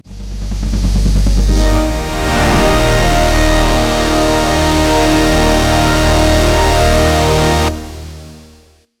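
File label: thriller music gets louder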